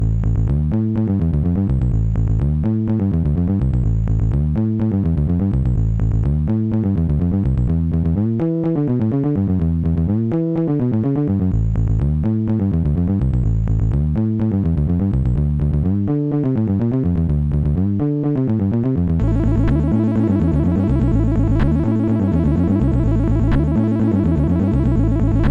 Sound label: tubular bells; MusicLine